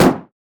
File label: blue minion mech basic attack; LoL